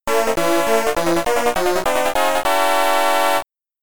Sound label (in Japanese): グランプリ・ゴール・ミュージック